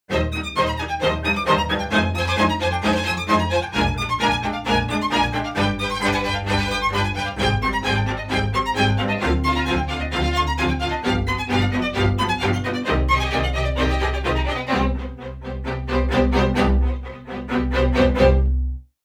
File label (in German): Streicher Tonografie Apparatebau V76